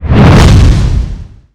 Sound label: common swing